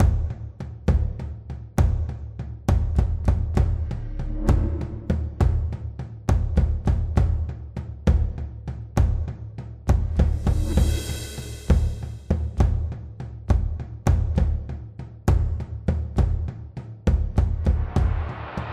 Combat Music

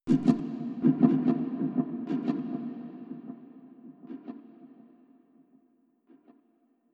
tribal echo

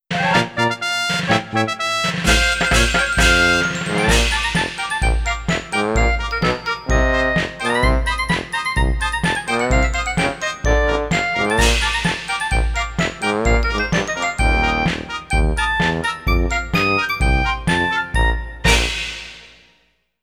Cannon Pan In; Go Play Circus Star; Wii

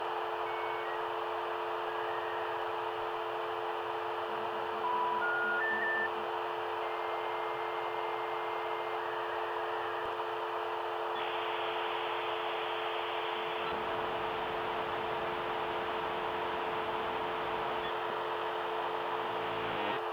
Ambient Comms

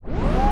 lobby spinup; OK K.O. Lets Play Heroes; mus boxmore

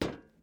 metal step

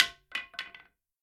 Drop Axe

